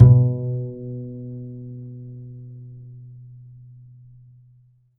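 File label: double bass B2; Univers Sons; Jazzistic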